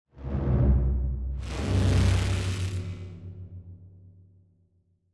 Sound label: Electrical Feedback Front To Rear To Front; 5.1 STEREO MIX; Blastwave